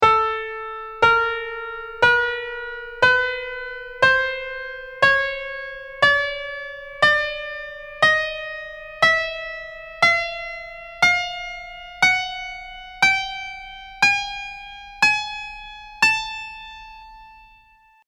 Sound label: scale